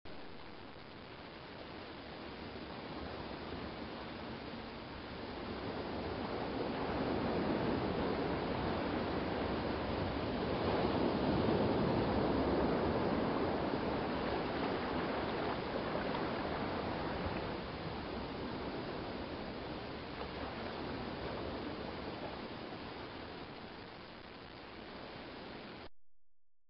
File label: lighthouse